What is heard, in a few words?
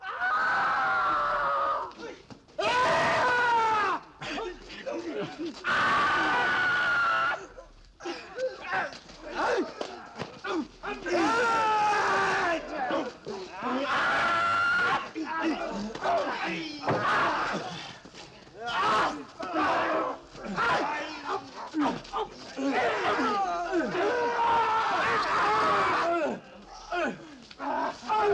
Japanese Soldiers Scream; 1940s Hollywood